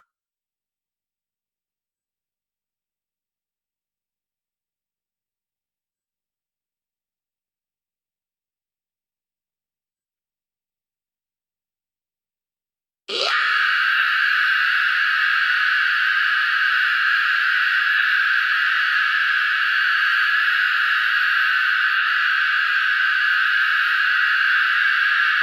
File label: Vocals